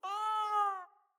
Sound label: Kitten Meow Agitated; Catbrow